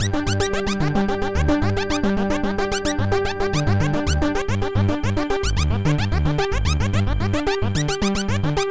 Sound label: Electro